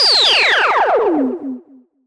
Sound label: Zapps, sci-fi